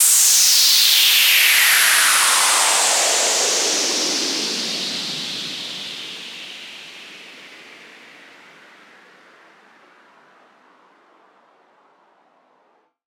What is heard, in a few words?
Downshifter White; Riddim; One-Shots; SFX; Ghosthack; Riddim Essentials Volume 2